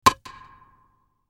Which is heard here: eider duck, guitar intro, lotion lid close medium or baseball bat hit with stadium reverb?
baseball bat hit with stadium reverb